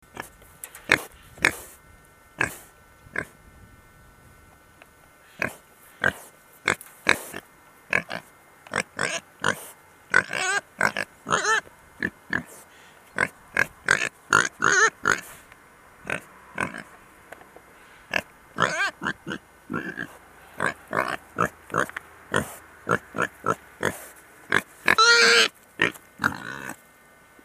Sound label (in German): wildschwein